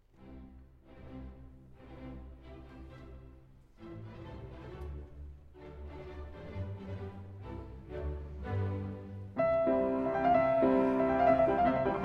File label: Piano Concerto in G Major, Rondo. Vivace; Piano Concerto No. 4; Op. 58